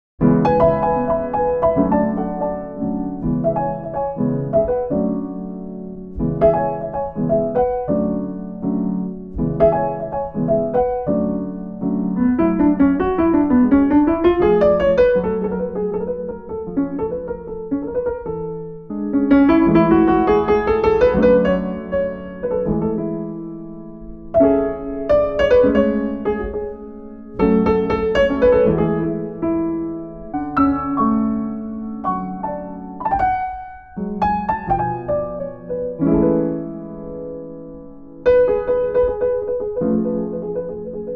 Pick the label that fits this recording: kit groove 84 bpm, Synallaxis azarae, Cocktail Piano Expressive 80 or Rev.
Cocktail Piano Expressive 80